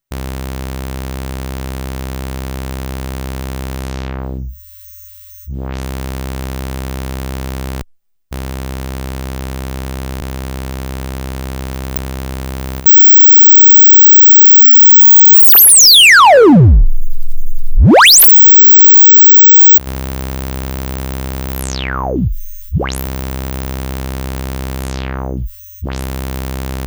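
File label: lowpass, synth; Polivoks formanta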